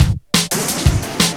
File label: Space Age Break, Breaks, Jungle; Blu Mar Ten - Jungle Jungle - 1989 to 1999 Samplepack